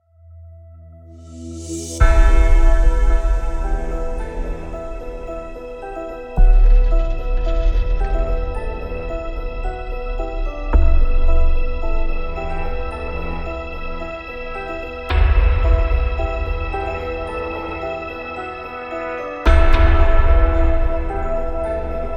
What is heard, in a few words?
dark bells